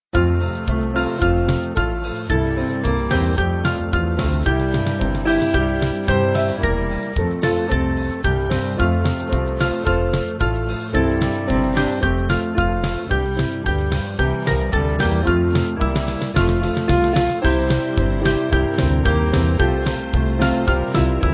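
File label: Band, Music Backing Files for Churches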